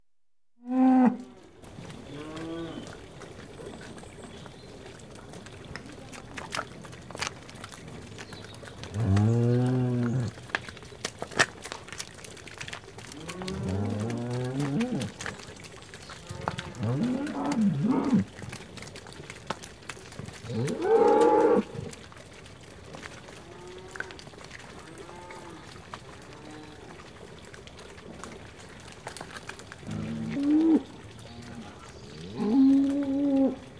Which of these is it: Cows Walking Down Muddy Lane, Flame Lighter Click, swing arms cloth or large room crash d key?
Cows Walking Down Muddy Lane